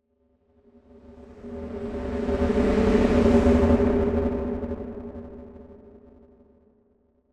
Whoosh Gritty Tone Hoarse Pass - Medium Buzzing; Hollywood Edge; sound designer's toolkit v2

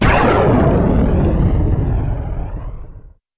Gun, Laser, Single Heavy Shot; gun and weapon sounds; captain audio; fully loaded

